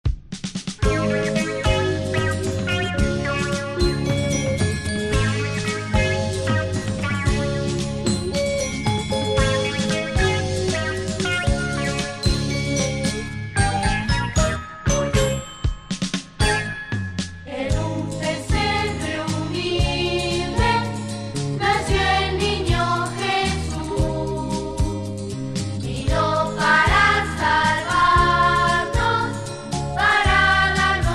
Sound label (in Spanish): Campanas A Sonar